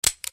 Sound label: Empty Clip